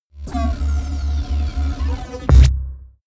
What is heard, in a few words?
promo pad; whooshes-rises